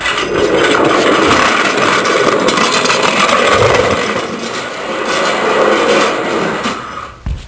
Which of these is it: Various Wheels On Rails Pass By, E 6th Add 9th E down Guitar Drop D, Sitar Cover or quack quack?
Various Wheels On Rails Pass By